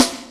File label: Snare, E-MU Proteus-2000